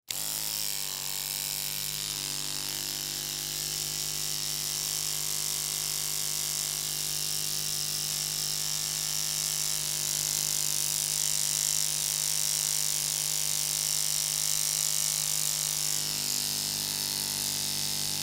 Toothbrush Electric, Toothbrush Electric On Run Off; Appliance; Bathroom; Household; Blastwave